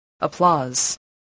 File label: applause